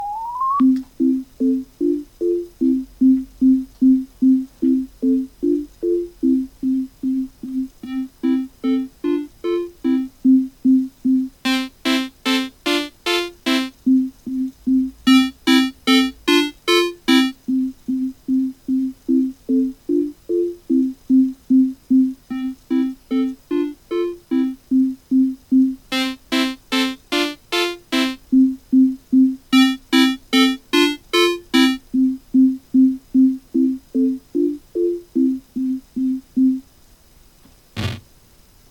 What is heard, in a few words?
Two Tone 8 Step ADSR